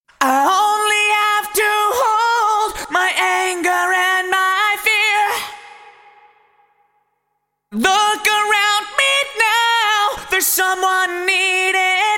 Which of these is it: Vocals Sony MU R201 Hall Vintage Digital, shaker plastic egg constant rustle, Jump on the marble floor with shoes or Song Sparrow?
Vocals Sony MU R201 Hall Vintage Digital